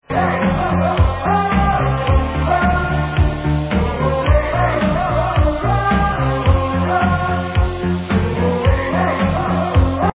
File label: kicked music; cstrike